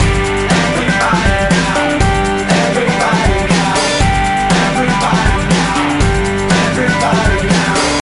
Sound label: Blues Rock; gamerip; Happy Feet Two; nds